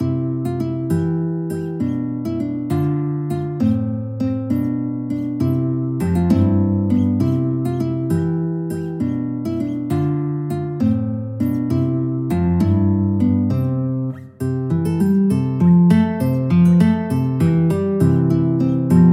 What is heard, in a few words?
sonata guitar